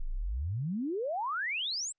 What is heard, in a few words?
echo Amp